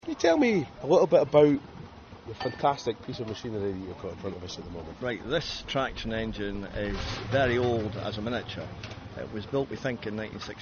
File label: miniature traction engine